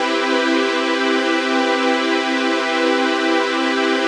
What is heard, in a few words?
CHRD PAD; Best Service; XX Large Pads; Sample CDs